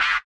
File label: buzzer; Spectrasonics; Metamorphosis